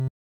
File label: Panel Upload Near; Among Us